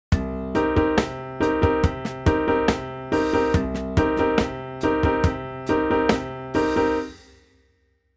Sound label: funk piano rhythm chords